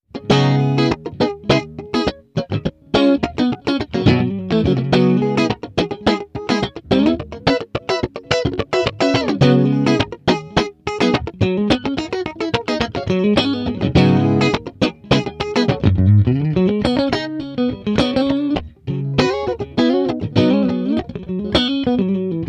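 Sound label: clean mode chorus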